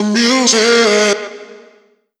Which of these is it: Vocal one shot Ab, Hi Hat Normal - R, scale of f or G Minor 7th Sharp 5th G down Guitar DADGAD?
Vocal one shot Ab